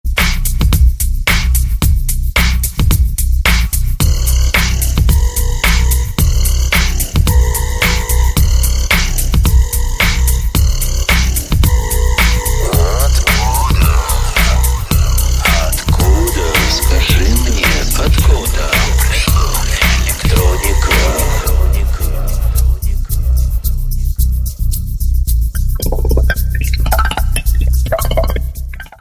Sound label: alien mix ringtone